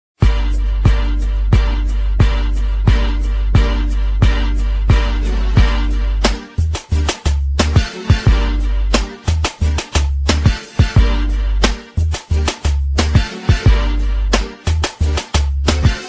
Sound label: bass freestyle rap beat instrumental